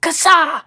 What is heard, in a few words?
Battle Shout; Quirrel